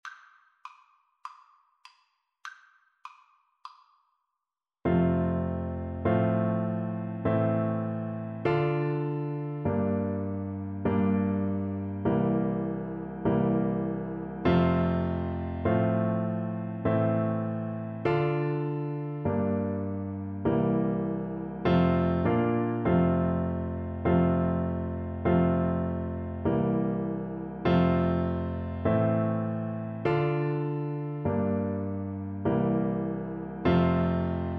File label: Bassoon